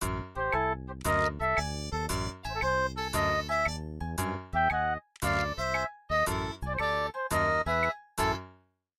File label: MIDI Animation stings